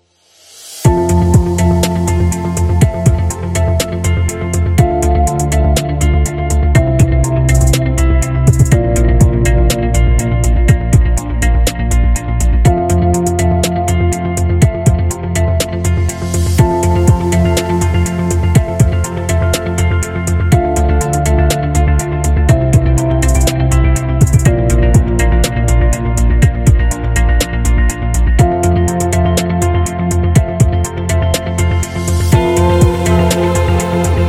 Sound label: Lounge; corporate music vol 21